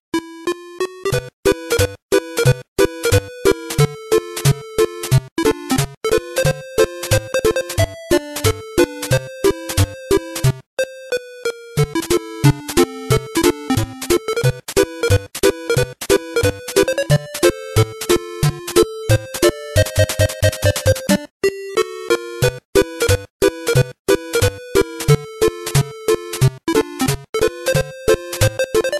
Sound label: tetris nes 8 bit